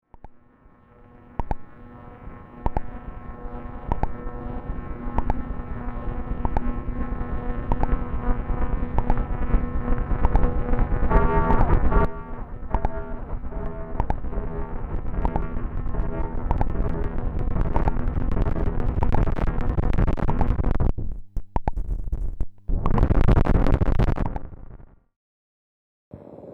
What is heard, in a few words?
Moog Modular